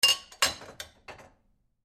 Dishwasher Glass, Dishwasher Place Glass Cup In Rack; Whirlpool Appliance; Household; Blastwave